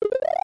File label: hit while flying; smw